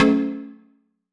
Reel Stop; netent

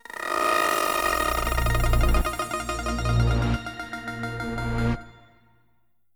lucky Reel Spin; netent

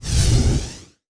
win sweep Vertical; netent

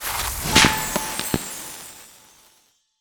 small win; netent

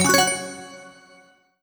Big Win Countup End

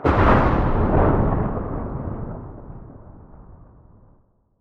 lightning Right; netent

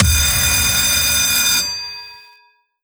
big Win Start; netent

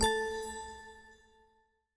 Countup End; WildWater

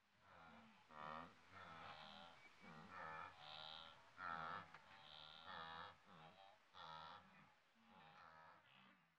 animals wildebeasts

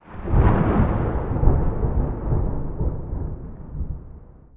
thunder

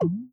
mega Win Text Change; PIXIGSLOT; netent

